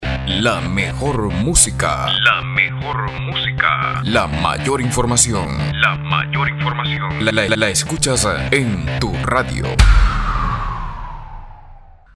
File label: jingle